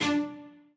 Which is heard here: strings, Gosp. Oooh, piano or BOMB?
strings